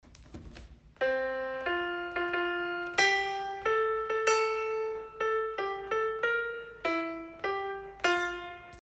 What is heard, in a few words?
jingle; sarpi classe 4A; natale 2021; scuola secondaria secondo grado; bergamo